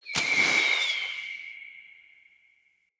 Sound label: Bow Arrow By Charged Echo; Weapons; Player; Bows; DendritBow